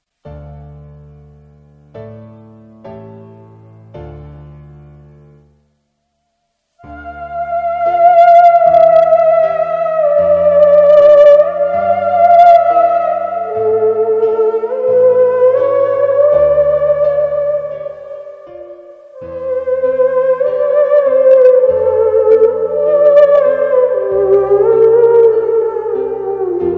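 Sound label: theremin; Терменвокс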